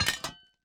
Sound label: mine metal